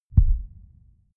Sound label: synth low boom